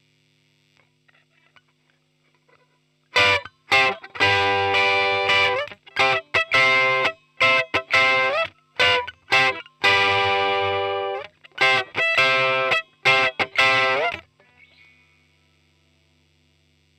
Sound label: Digi Custom Vint